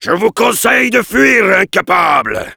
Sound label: yell, fr; Heavy